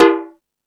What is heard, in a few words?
Rap Session, E-MU Pro-Cussion